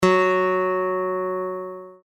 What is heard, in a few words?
G flat, guitar, E flat tuning; tune your guitar